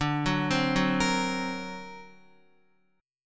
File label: D Augmented 7th D down Guitar